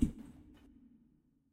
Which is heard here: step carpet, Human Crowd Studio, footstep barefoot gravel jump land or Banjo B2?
step carpet